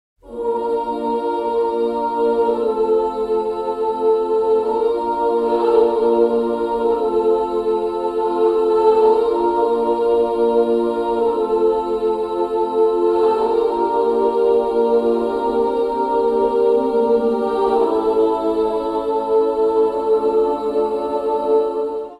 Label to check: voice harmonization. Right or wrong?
Right